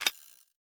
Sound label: Hook Disengage